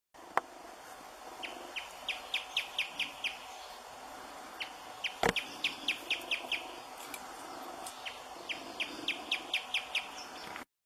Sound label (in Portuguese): Canto do casaca de couro da lama